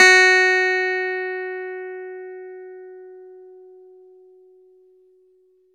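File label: clavinet; Roland LCDP10 Keys of the 60s and 70s 2; Clav DB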